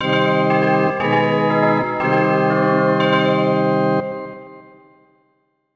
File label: Church Door Opens; Banjo-Kazooie HD; N64 gamerip 1998